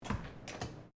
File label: Door Pivot Industrial Freezer Open, industrial fridge door; Miscellaneous Doors